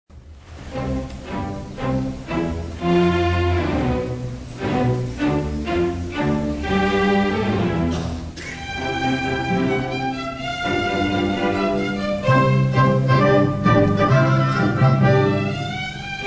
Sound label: Piano Concerto in C, Allegro maestoso